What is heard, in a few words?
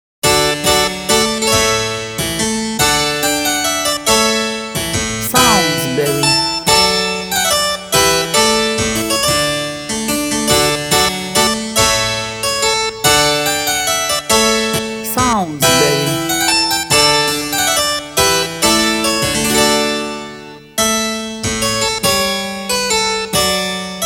Minuet